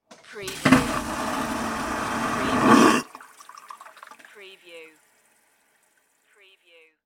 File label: Airplane Toilet Flush; sound effects bible